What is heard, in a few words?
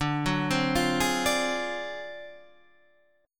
D 7th Sharp 9th Flat 5th D down Guitar Standard; D7#9b5 arpeggiated; JGuitar